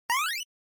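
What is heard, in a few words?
Game Boy General Pitch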